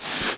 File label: new scratch